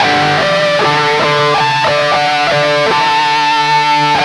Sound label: Harmonic; Sony Creative Software; Hip-Hop Street Beats Construction Kit; UniversalGroove